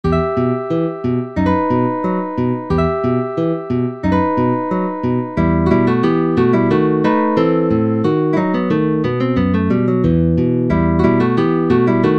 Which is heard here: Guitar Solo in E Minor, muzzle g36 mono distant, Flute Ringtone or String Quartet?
Guitar Solo in E Minor